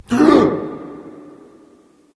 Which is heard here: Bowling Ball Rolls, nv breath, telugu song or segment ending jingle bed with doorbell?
nv breath